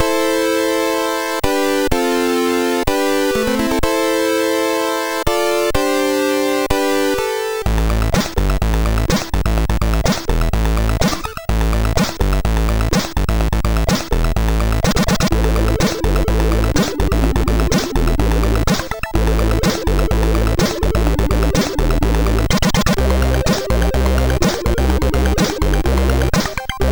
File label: Jingle, MOS6581R4; Die Hard-Forum 64